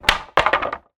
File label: impact wood plank large drop hard ground; zapsplat